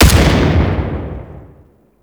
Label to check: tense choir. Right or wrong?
Wrong